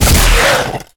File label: Tongue Grab Attack; Evolve; Behemoth